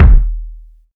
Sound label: long tail reverb kick single hit d sharp key